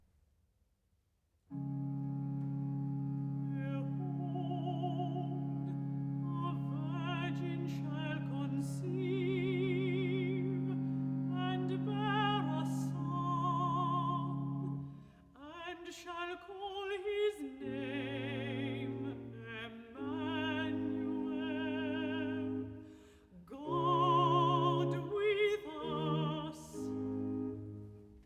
Recitativo (Alto) "Behold, a virgin shall conceive"; Messiah